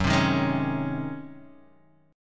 C sharp Major 7th Suspended 2nd Suspended 4th F sharp